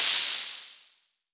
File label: HHo Blofeld; Waldorf